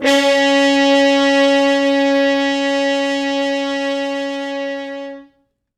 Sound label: Horns stops